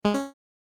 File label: alert